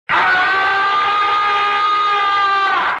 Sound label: yell